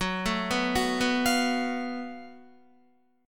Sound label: F sharp Minor Major 7th Double Flat 5th, Guitar; F#mM7bb5 arpeggiated; JGuitar